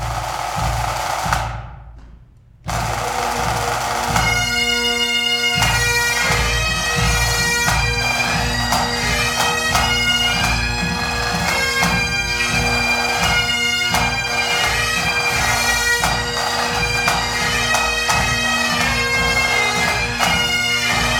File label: Pipe Band